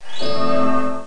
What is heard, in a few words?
Dreamy; Games World; Shareware Games; Querkz 1.5